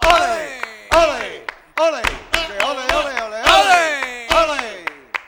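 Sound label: CLAP + VOCAL, MCLAPS+VOX; Best Service; World Colours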